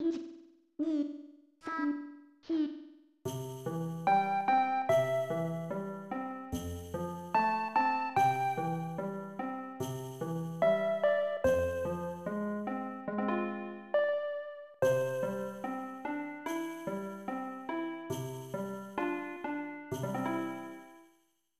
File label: Harp; Heracles no Eikou IV Kamigami kara no Okurimono